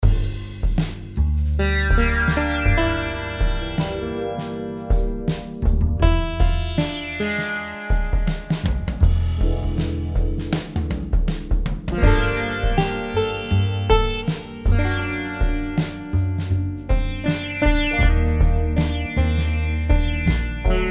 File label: electric sitar 3 sources